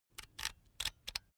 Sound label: electric drill template adjust soft; maschinen; bohrer; soundpool